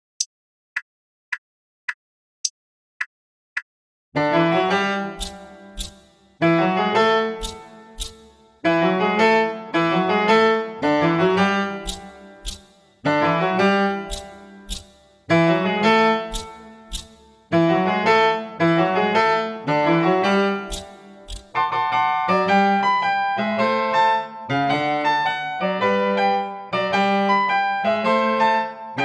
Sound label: Piano; flexible violin ensemble